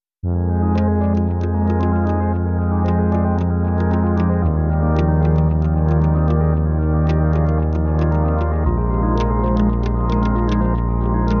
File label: Healthy Success Patient Underscore